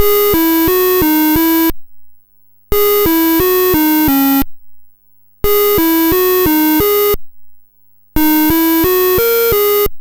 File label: Apple II Synth, Chiptune